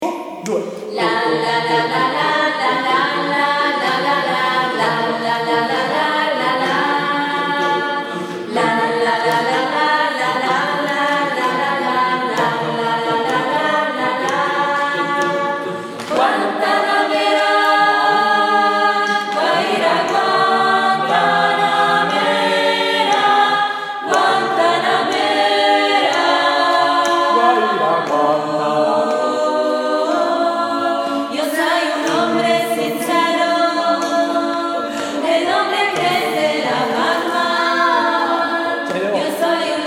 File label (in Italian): coro polifonico; canti liturgici